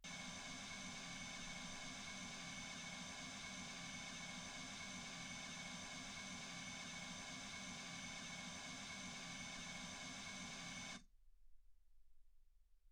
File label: mls 48kHz